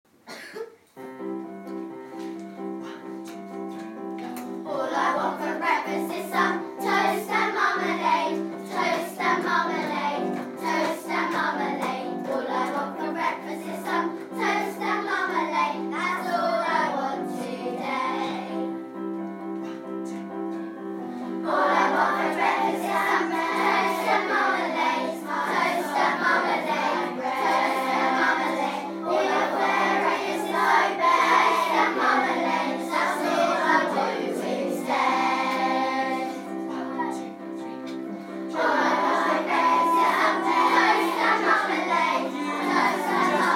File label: Breakfast Song